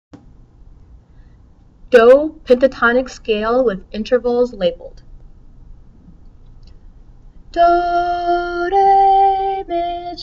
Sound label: do pentatonic intervals